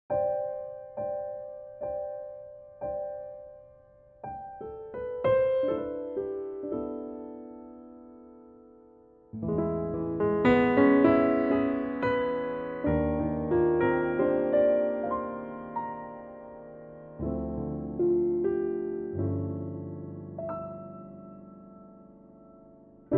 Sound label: C piano trak; key - C - vocal range - B to E; perfect for an intimate piano only arrangement, no tempo in mind